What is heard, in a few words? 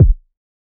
create me a kick that, lofi